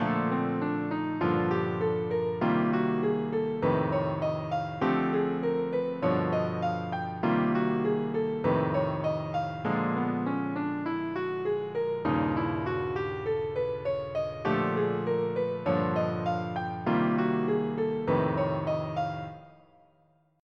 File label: rhythm changes altered